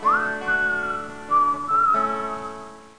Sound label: Ring In; Pulp Fiction